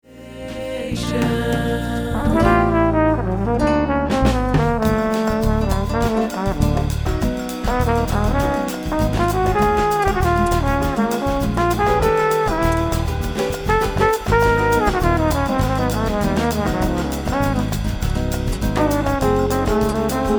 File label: FLUGEL OPEN SOLO